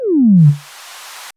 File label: DEEP SIGH; Crypton - DrumBase5000